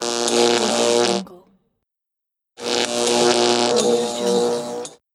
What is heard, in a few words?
neon light flicker